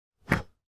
blow out candle; foley

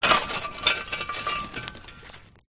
industrial shoveling hot coals fire; zapsplat

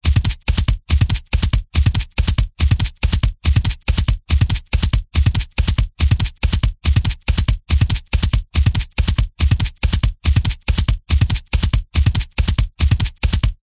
animals horse running fast ground hoaves designed

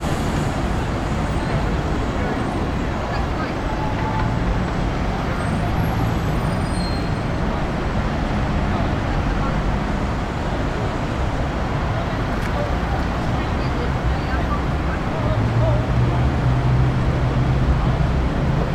ambience urban city london trafalgar square; sound effects one

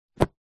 household books pile set down on surface

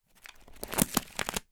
paper pack flick through sheets; foley

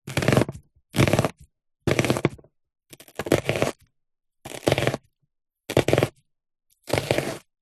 food chopping onion with knife slicing

